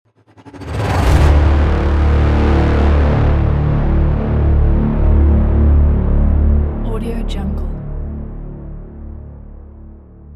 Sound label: brass